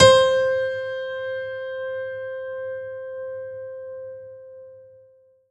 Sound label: Korg TR Rack Acoustic Piano C5